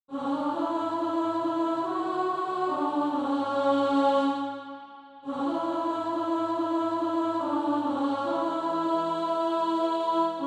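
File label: alto, Practise Music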